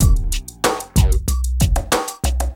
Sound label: BEAT, Beats 93-96bpm; PlatinumPhattCD1; E-MU Producer Series Vol. 8 – Platinum Phatt